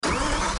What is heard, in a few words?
engine start, vehicles cars; tdmcars